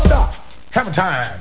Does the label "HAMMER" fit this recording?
Yes